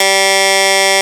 Clavinet A2

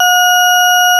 DrawBar Organ C5

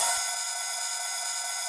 Open High Hat

